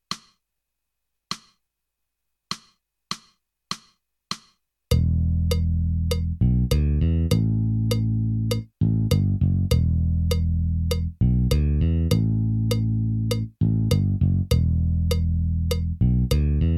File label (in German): Viertel-Metronom